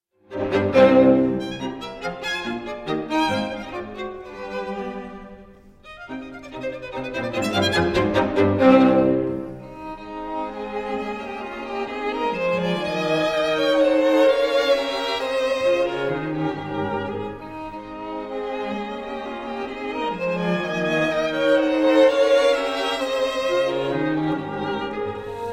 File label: clarinet concerto no 1 third movement; Bernhard Crusell